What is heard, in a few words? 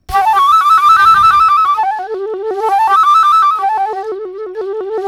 FLUTE FX; Greytsounds - Philip Wolfe David Crozier's Saxophones